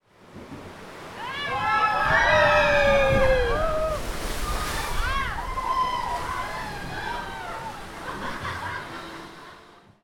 Water Ride Screams

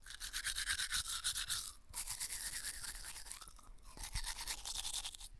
Brushing Teeth

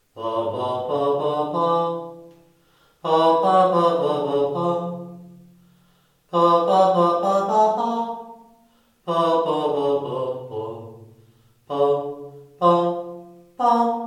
Bathroom humming

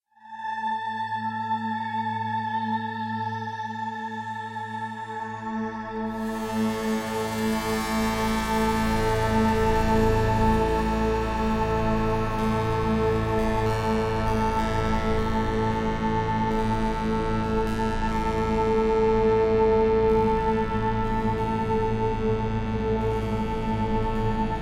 Tone Portal

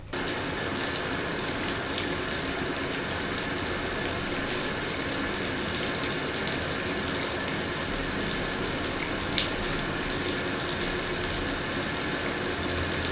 Shower Water

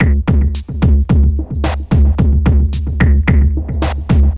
Roadroller beat

